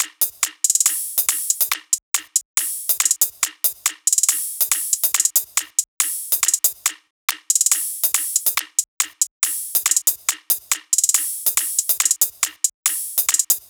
Bpm140 Hostile Perc

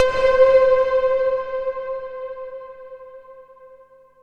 analog pan hit C4

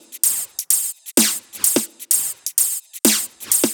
Drums Top 128

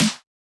snare bended

